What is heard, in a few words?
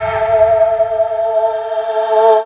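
synth, Production Effects; Best Service - XXL Production Effects; SYN-FX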